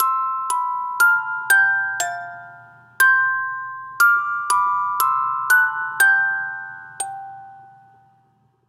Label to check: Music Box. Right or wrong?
Right